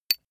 small metal impact soft high; industrie wirtschaft; metall

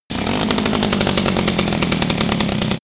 chain saw slow; maschinen; saege; soundpool

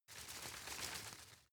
grab shake soft; berufe; foerster

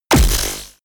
sci-fi plasma cannon fire; schusswaffen

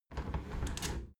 window handle squeak turn close halfway soft

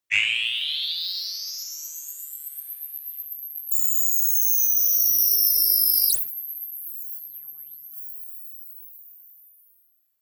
rise fall, sweeps swells; comedy-cartoon; soundpool

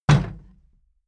plastic box big kick rattle; soundpool

